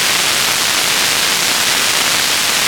RING NOISE; Zero-G Ambient 1

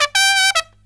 TRUMP, Killer Brass Riffs

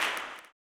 CLAP, blues & soul; ILIO; Vocal Planet

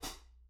Soft Dark Whuf, Hats; Airwindows

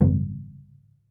TOLOWA, American Natives, Earth Tones; E-MU Formula 4000 Series Vol. 4

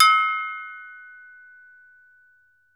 TOKE BELL, Drums&Percussion; E-MU Producer Series Vol. 7 – Old World Instruments

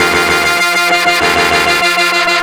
Horn Sect Effect

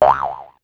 Spring, Yamaha DD-35

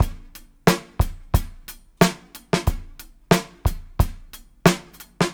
Live Beats, R&B Drums

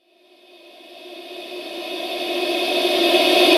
Reverse Vocals F#; Vengeance - Essential Clubsounds Vol.4; Effects

